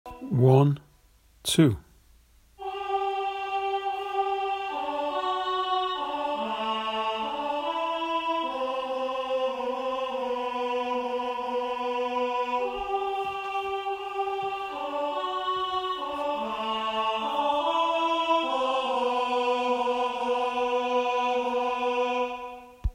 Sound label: alto